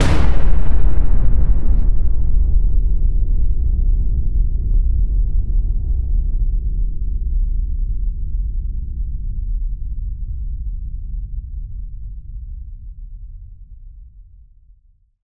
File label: batten stop, Cinematic Metal Impacts